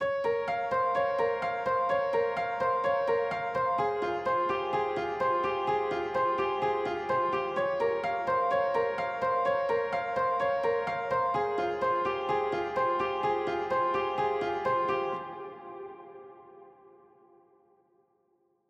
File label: 127 BPM F Min Piano; Trap Melody Loops; Loop Stems; Cymatics; Nightmares; Major